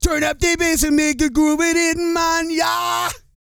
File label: REGGAE, Vocal Planet World Voices